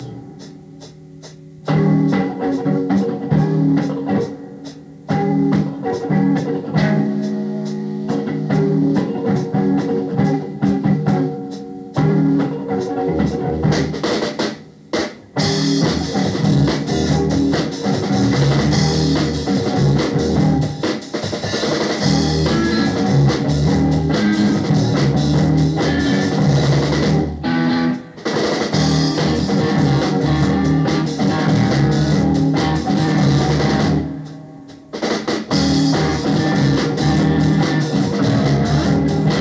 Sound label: pop noise on motown beat